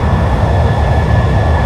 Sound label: Plane Jet Loop; Airplanes